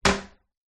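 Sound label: Toilet Lid Close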